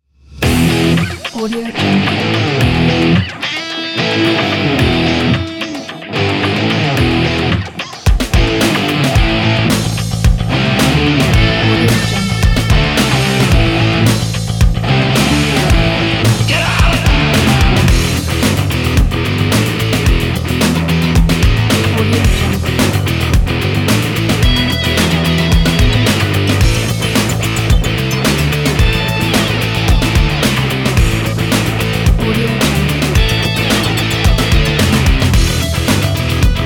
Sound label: Action Rock Groove